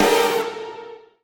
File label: Combo Hit; Bee Movie Game; Trapped - Ken Combo 1 Hit